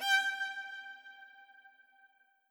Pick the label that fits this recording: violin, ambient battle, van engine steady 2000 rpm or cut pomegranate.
violin